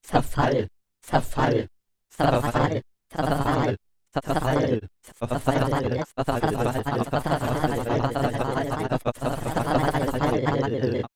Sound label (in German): mehrere Stimmen